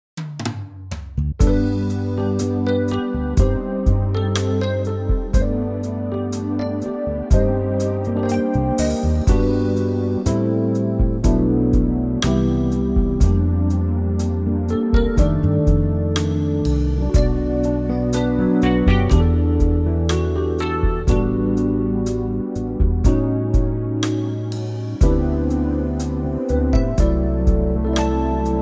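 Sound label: smooth G, Backing Tracks for Male Vocals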